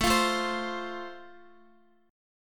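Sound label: A Minor Sharp 5th, Guitar, chord strum; Am#5 strummed; x,x,9,10,9,8; JGuitar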